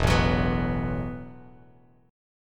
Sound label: E Suspended 2nd Suspended 4th E, Bass Standard